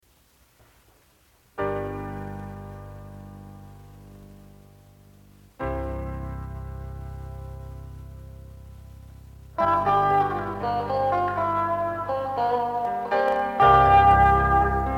Piano Guitar Intro

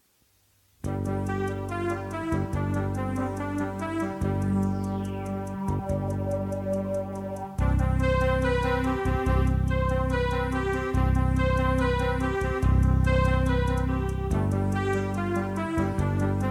Synth Intro

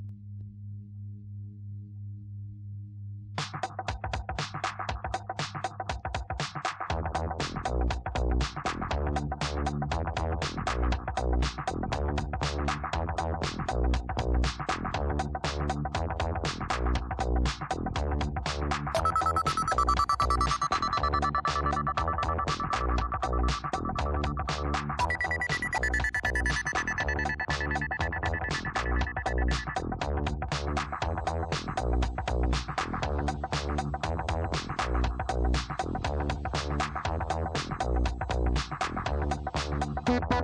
Synth pop bass Bounce; tape

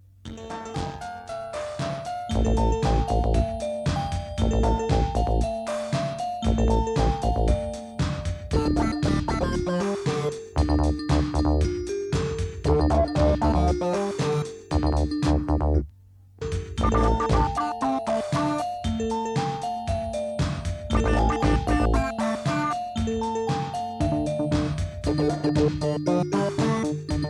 Synth Quack

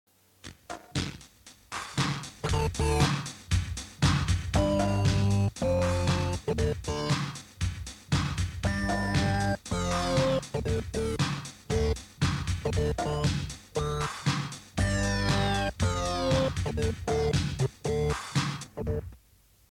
Synth

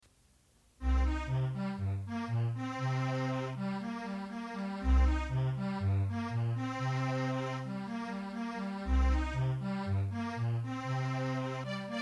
Strings variation

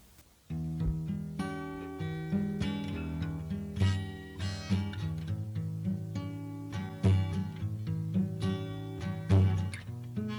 guitar pluck jazz blues

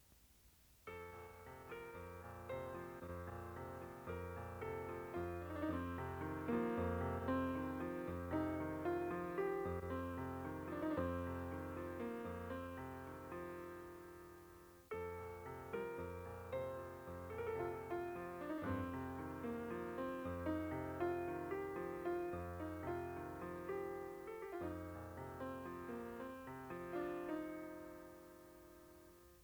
Piano Solo

